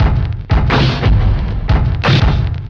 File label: thunderous, drum loops, funky